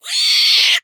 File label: Monsters Monster Roar Growl; Screams Shouts 2; soundbits